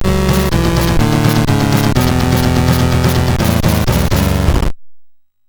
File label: intermission, sid MOS6581R4; Tusari intermission